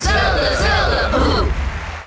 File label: Cheer; Zelda; Melee